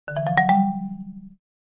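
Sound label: Marimba Short Ascending; mallets marimba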